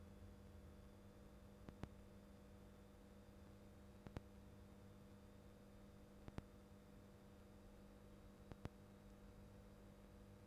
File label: clicks